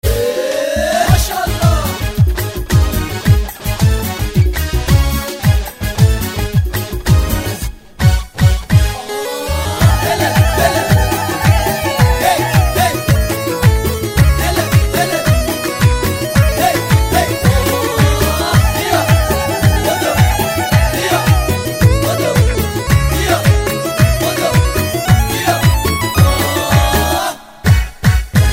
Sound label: Bandari Music